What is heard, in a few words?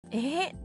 ehehe